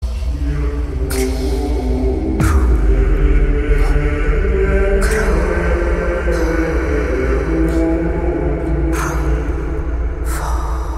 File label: arabic ringtone